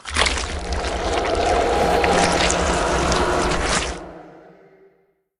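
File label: movement death; Smite; Cabrakan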